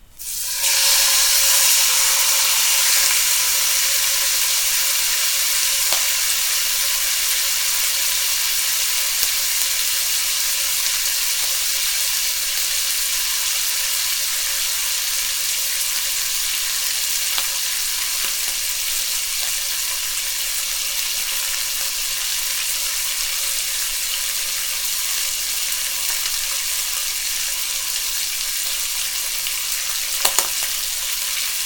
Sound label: grilling; cooking